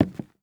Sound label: Footsteps Wood; HauntedBloodlines; SFX Producer